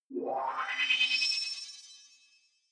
sci-fi transmission incoming

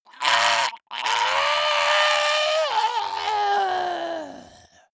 monster death scream high

